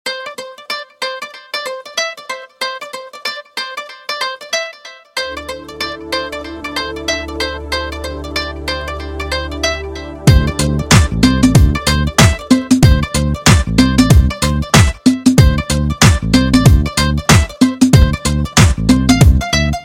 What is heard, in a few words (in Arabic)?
رنة هاتف موسيقى جيتار; رنة هاتف موسيقى جيتار مشهورة